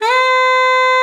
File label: SLID C5, ALTOSAX; Xsample VOL-2